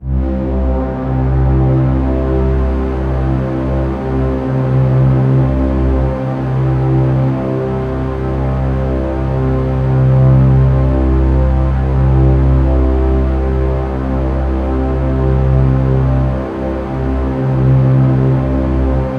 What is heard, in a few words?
ms20 massive layer; MS-20 layers